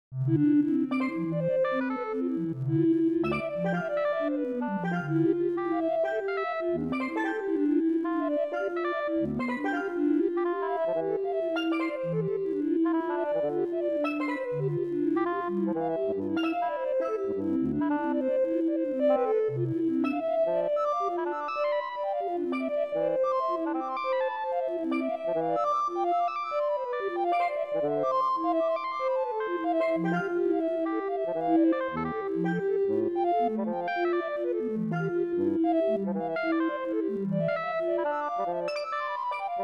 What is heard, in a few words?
recorder oboe steel drums and lots of trills; hocketting